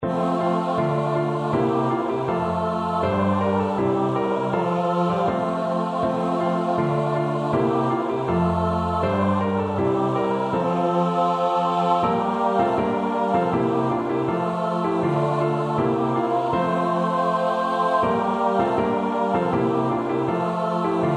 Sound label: ki eshmra shabat CHOIR